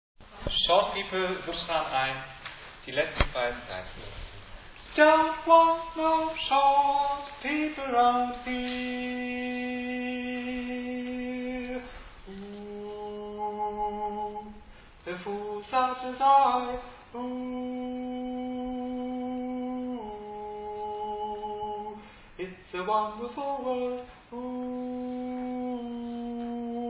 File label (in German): Sopran1